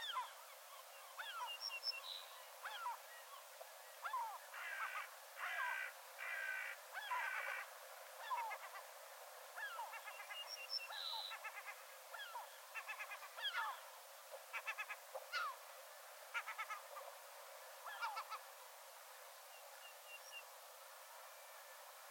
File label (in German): steinortolan